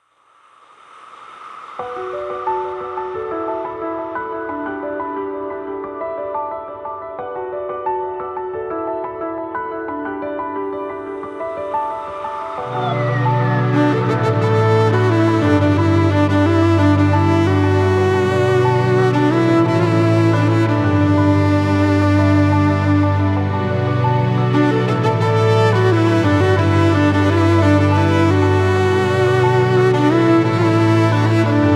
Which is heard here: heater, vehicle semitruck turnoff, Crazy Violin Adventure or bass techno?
Crazy Violin Adventure